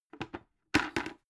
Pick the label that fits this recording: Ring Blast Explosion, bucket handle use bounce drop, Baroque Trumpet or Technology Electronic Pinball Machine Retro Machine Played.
bucket handle use bounce drop